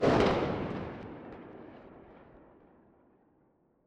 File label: p90 rev; weapons